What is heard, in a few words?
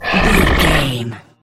troll monster breath wobble